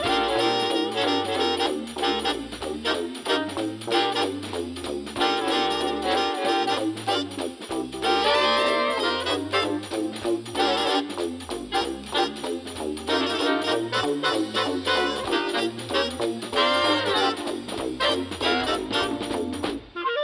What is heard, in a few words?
boombox